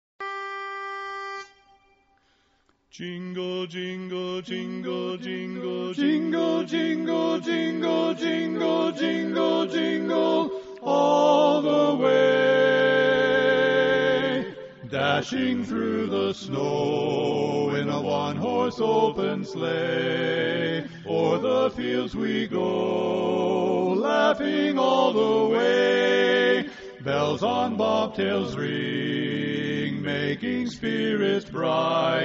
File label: Jingle Bells Medley Mix